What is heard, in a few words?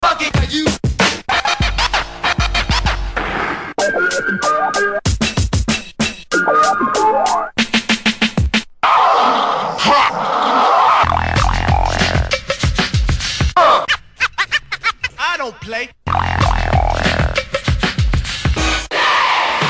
Scratch tools